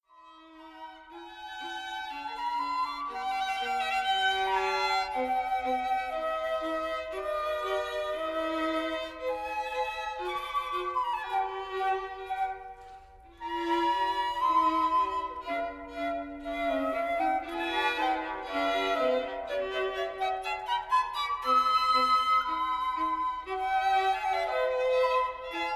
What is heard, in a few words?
quartet sample